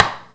gluhoj zvuk kuhonnogo nozha

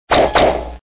pop korn priglushennii blizkii dvoinoi

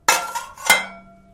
metall zakrivanie kostryuli spokojnij

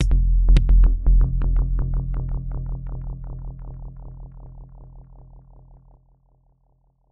temnij kik rezoniruyushij mehanicheskij reverberator

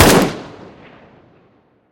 dolgoe eho posle vistrela

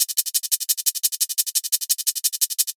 tochechnij ritmichnij shum

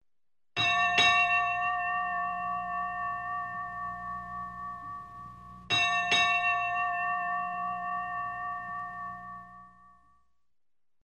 korabelnij kolokol

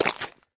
padenie v gryaz licom

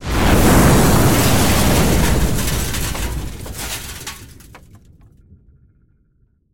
silnejshij vzriv s vibitimi oknami

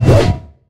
vzmah ricarskim rzhavim mechom

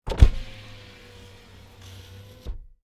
otkrivayut okno v mashine

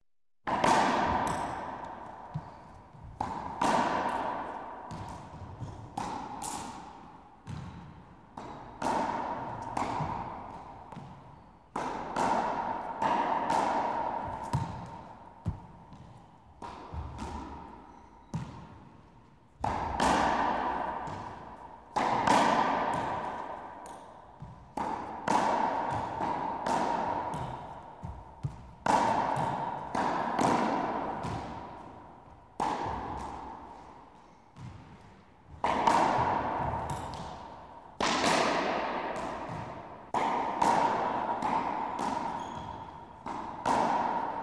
igra v raketbol racquetball

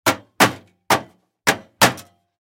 negromkij stuk molotkom vo vremya remonta

